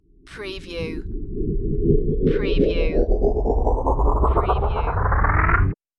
Dark Crunchy Rise

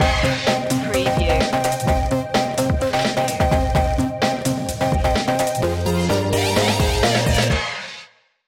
bass addiction stinger

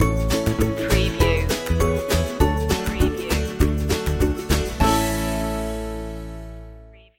fun in the yard stinger

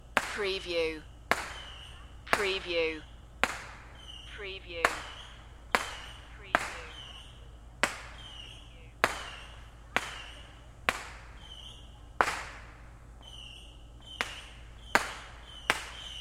Fireworks

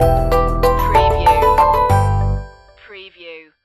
light signals stinger

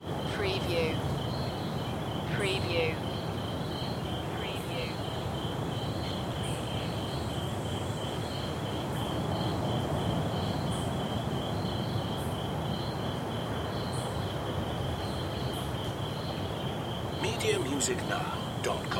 rainforest and ocean

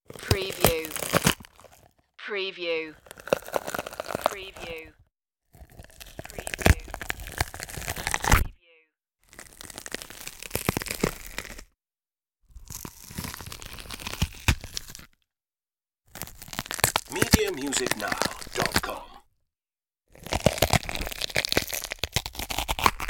zombie munch

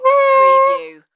Sax Complain

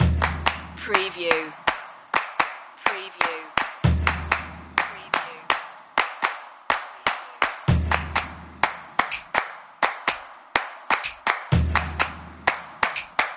exciting drums; 60secs

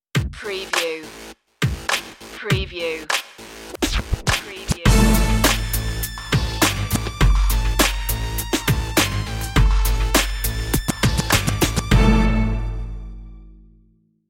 hip hop intro

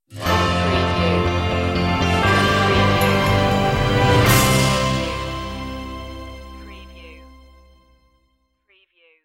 stinger

